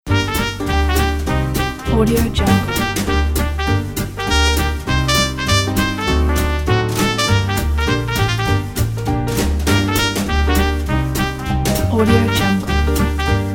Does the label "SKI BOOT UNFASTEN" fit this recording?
No